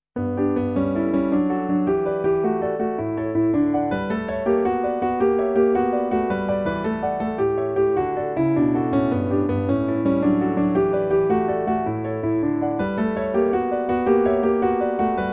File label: Piano Solo